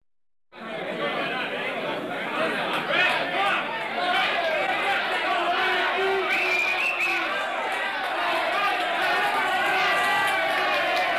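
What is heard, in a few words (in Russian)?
реакция одобрения с аплодисментами и свистом внутри помещения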